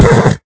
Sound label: hit, horse; minecraft